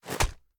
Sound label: raise first shoulder, sh; reloads; iw8; romeo870; gmod